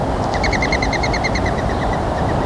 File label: dove wings; natureNavigator